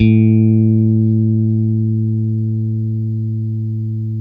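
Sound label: Stratocaster, Guitar; Quantum Leap - Guitar & Bass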